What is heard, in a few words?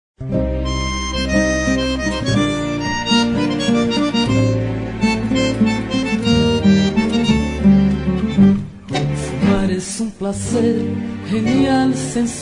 TANGO